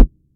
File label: key press; « DEKU » - MHA